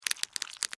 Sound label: Alien Creature, Scifi, Alien, Creature, Movement, Insect, Science, Fiction; BlastWave